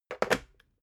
water boiler put down

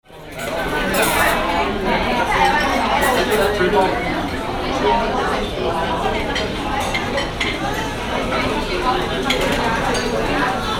restaurant

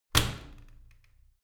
washing machine close hard

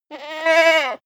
goat baa short male

